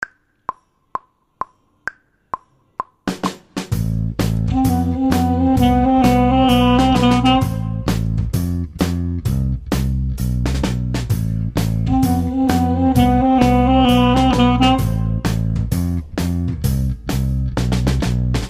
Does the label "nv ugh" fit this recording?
No